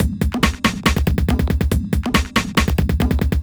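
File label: industrial 140 kit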